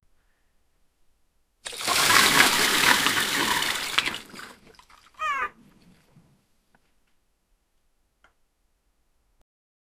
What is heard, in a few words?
witch laugh